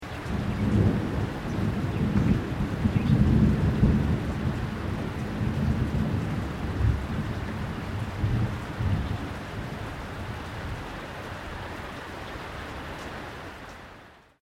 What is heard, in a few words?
THUNDER DISTANT LOW RAIN; Elemental weather effects